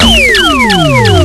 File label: laser